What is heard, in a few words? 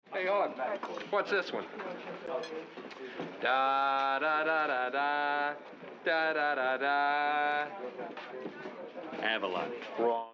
trapper hums